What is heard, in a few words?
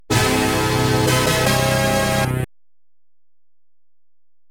Unknown Jingle; jurassic park data east pinball soundtrack